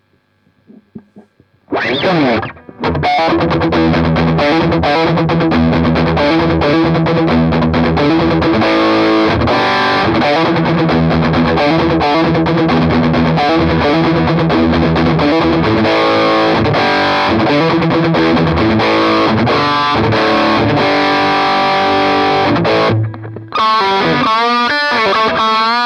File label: lead